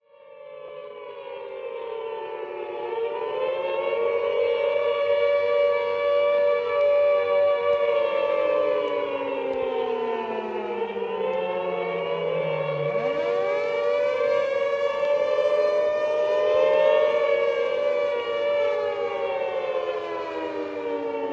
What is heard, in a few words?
Air Raid Sirens